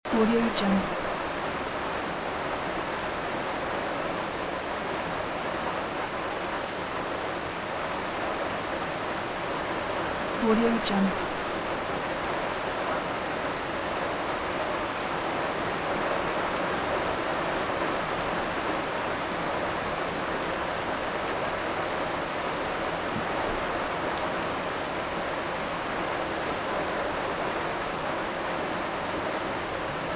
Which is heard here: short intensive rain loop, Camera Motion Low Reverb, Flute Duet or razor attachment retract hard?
short intensive rain loop